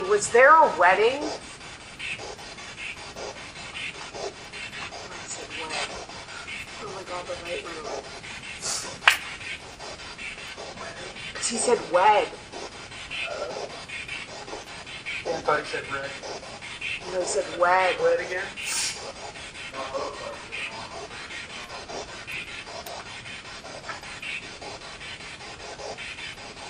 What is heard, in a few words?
Balcony Spirit Box